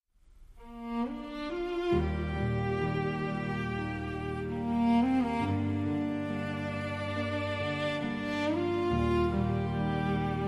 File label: Heartbreaking Love Ballad, The Sound Of Rain